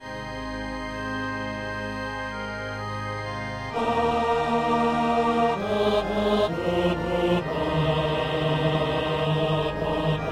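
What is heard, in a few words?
tenor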